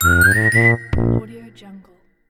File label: kiddos point win